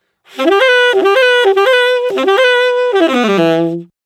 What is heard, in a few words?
Sax Solo; саксофон